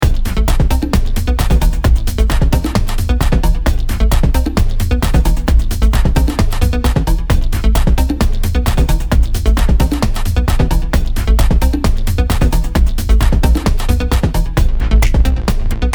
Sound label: song; space game